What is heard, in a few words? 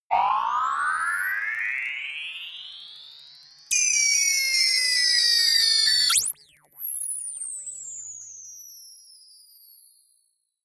rise fall, sweeps swells; comedy-cartoon; soundpool